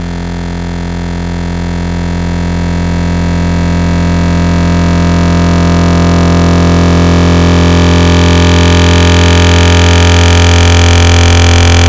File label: growl angular; Wavetables; Synthetic's Serum Collection; ESW Core Wavetables; Echo Sound Works Core Tables